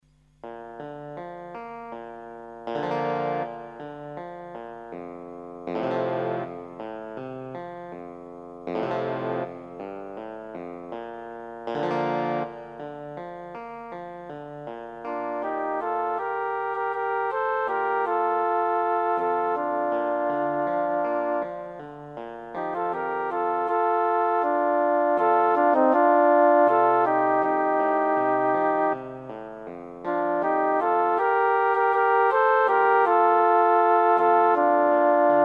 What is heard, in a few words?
for flute and clavicembalo stereo; cantiga 291 de alfonso x el sabio